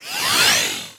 Enemy Waterplant Active; Disney Star Smash; Android, iOS, Mobile

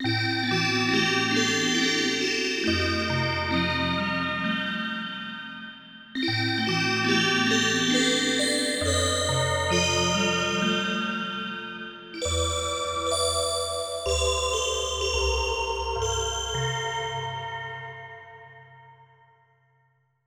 Horror House Organ; Shin Megami Tensei Devil Summoner Soul Hackers; 3DS

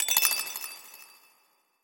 Goal

